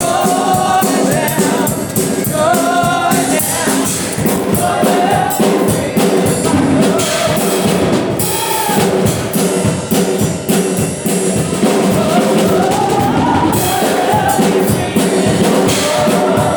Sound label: Joy Bells Keep Ringing In My Soul; Choir; Gospel; Congregational; Devotion Praise